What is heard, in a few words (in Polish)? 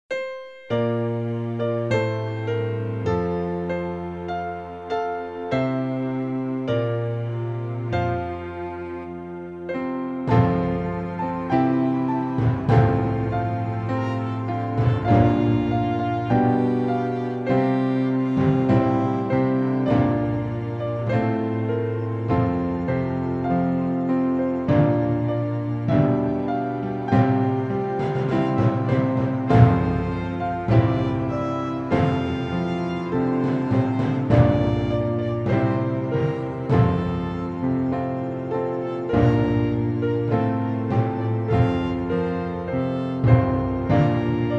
HYMN SZKOLY F 100bpm WERSJA ORKIESTRA; Linia melodyczna hymnu